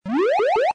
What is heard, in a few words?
gamble win; American Poker The Legends II